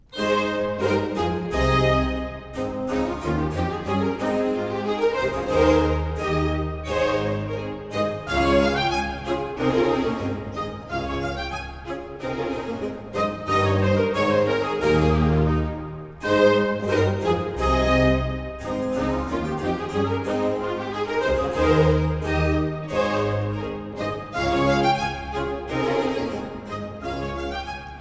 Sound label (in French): suite d'orchestre, Marche; Prologue; pour les différentes nations; Jean-Philippe Rameau; Dardanus